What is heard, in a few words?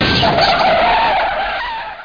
Car Crunch